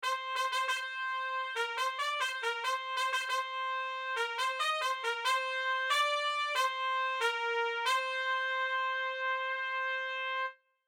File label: fanfare